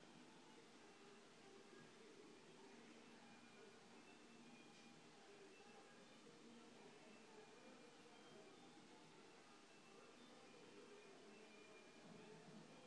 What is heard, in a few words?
background noise